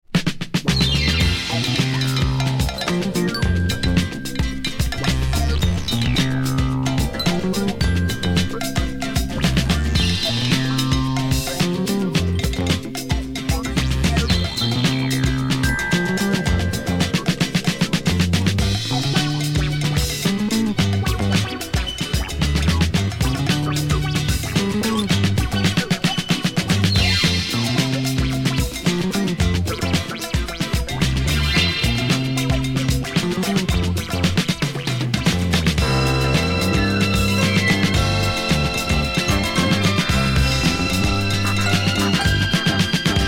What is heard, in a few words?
Samba session